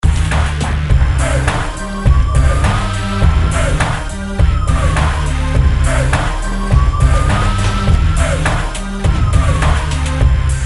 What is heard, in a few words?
Full Bass And Police